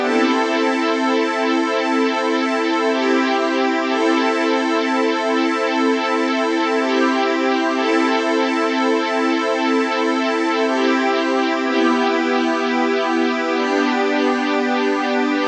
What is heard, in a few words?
Chords